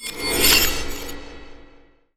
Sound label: arc chains; weapons; dota; pudge